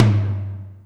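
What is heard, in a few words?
Lo Dbl RM, Alesis D4 drum machine tom; 300 Drum Machines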